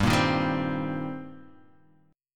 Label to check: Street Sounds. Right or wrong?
Wrong